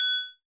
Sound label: triangle